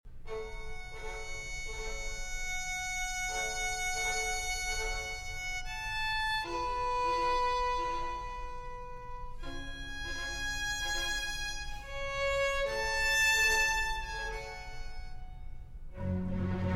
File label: Concerto For Violin and Strings in G Minor